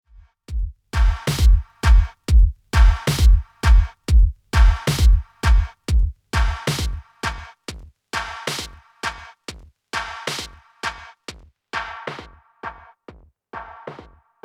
Channel LPF HPF

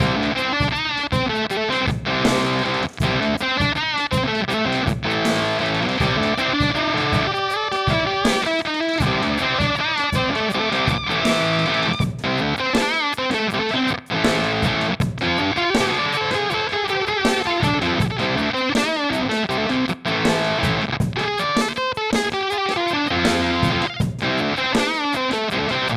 Beetronics Vezzpa Fuzzz

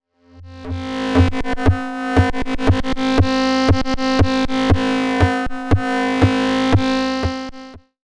Leploop V3 Loop

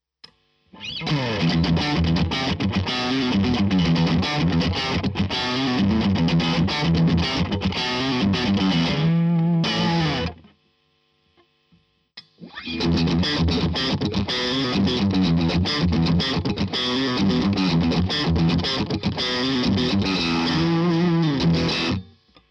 Gain SC

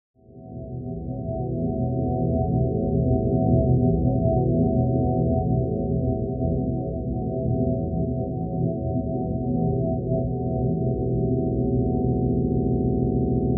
Tracktion Abyss Tone Colors Organic Straighten

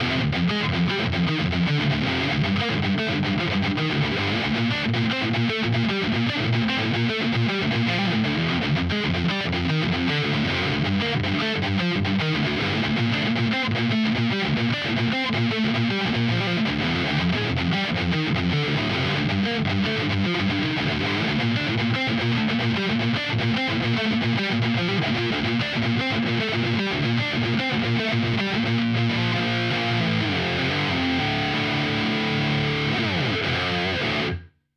Neural DSP Quad Cortex California

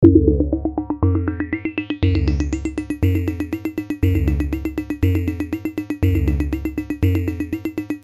Ableton Operator Dark Corners

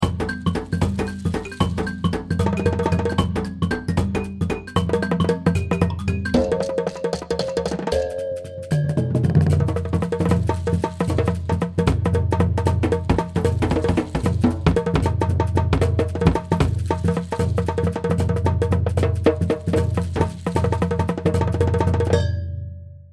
World Music Kit

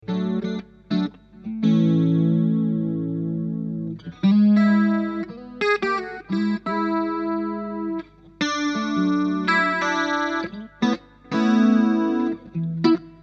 clean chorus

